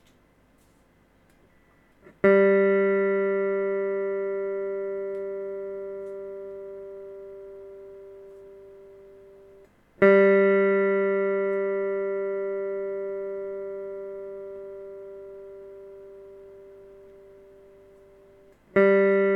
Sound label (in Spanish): Sonido de guitarra eléctrica cuerda 3 Sol G; afinar una guitarra